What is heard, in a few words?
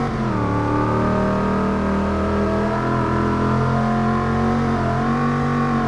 third, vehicles; tahoe